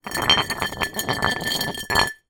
household glass jar large roll concrete ground; zapsplat

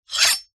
warfare knife blade draw

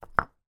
foley brick place down on hard ground; zapsplat

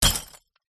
impact glass bottle smash hit with object

